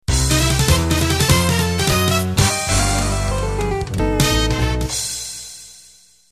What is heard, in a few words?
New Jive intro